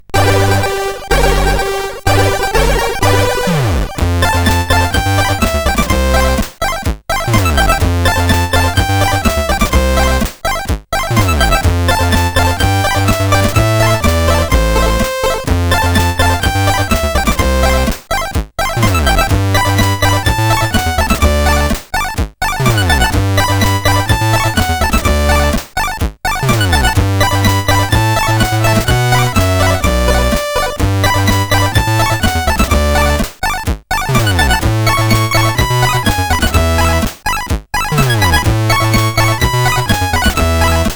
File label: Wasted Lands, CSG8580R5